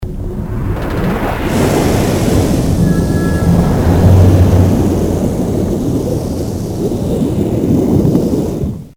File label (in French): depart sous marin